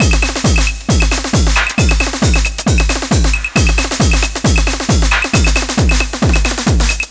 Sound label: DRM, LOOPS 135, House; Best Service ProSamples vol.10 - House; AKAI